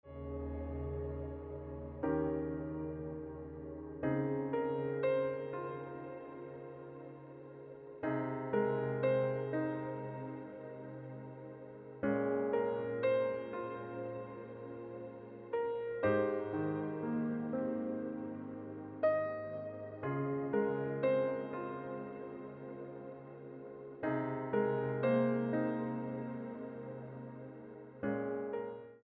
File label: Ringtone Piano Music